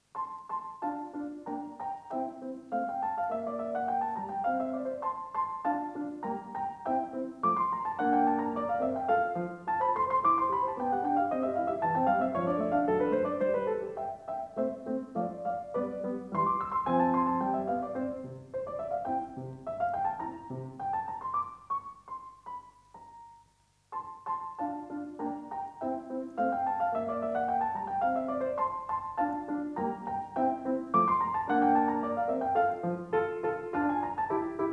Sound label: Sonata No. 19 In F Major, Rondò (Allegretto), Solo Piano; Mozart; Walter Gieseking